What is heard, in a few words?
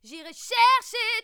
real Growl Voice; roughness